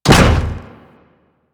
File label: Step Default; Enemies; Steps; Warframe; Corpus; Arachnoid Camper